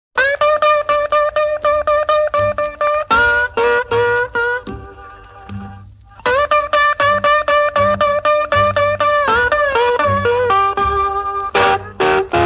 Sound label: mississippi delta blues